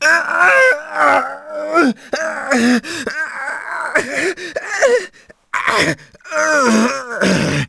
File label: moan; Shadow Man; shadman